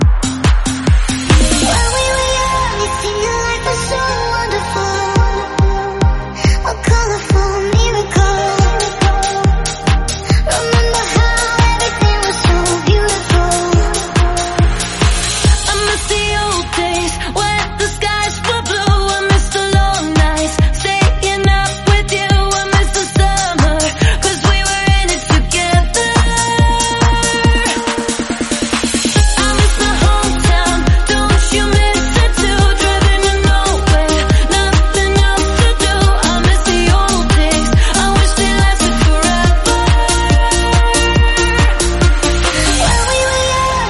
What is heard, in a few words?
REDRUM 140bpm Fm